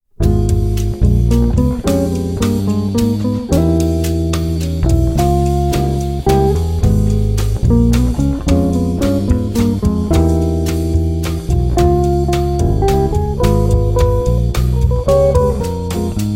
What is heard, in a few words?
jazz minor 5, guitar